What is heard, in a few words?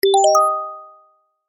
Nokia Message